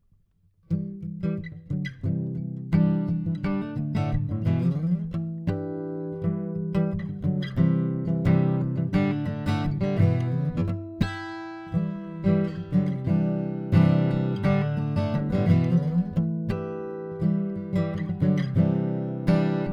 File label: Harmonic